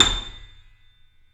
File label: KEY, YC7; Roland L-CD701; ff YC7 Mono